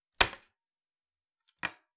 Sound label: Palette, WoodenPalette